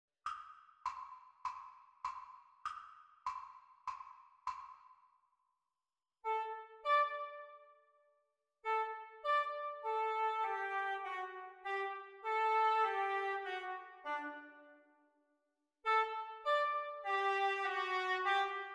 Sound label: Oboe Duets; exam speed